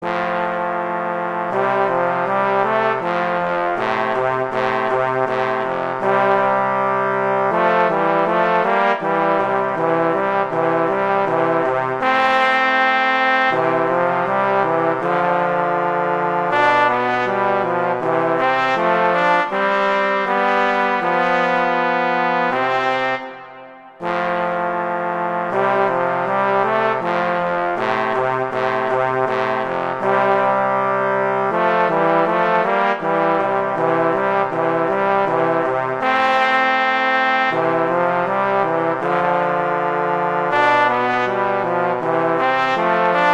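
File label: Duet, horn; Mozart; Duets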